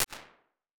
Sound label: Dialoge, Ambience and Small Rooms; Ableton; Convolution Reverb